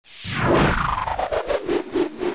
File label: Electric - Exit Windows